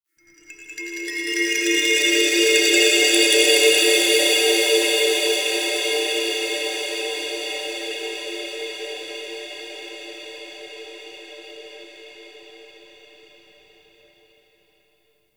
Kalimba; Warframe; Ambience; Nokko Colony

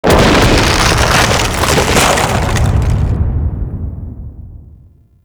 Mountain Pass Snow Crack; Ambience; Warframe; The War Within

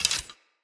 Hunter Bow Reload; Weapons; Enemy; Bows

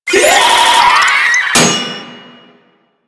Proto Infested Volatile Runner Explosion Warning; Warframe

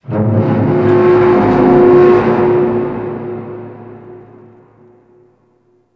Metal Wronk; Warframe; Tau; Ambience

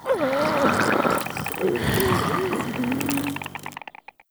Zombie Breathe; Warframe; Characters; Infested

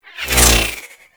Cluster Shot Whizzby; Enemies; Abilities; Warframe; Teralyst

